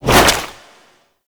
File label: Infested Tendril Whip, melee attack